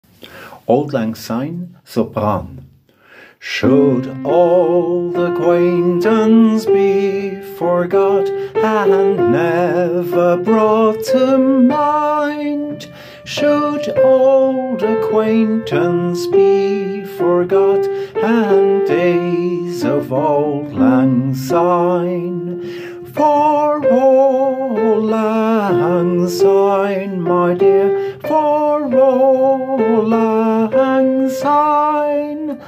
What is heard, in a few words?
Auld Lang Syne Sopran